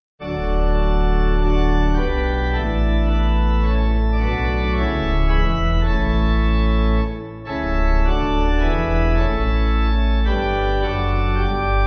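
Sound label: Pipe, Pipe Organ; God Calling Yet; Vulpius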